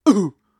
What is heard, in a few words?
Maggot Bounce; gamerip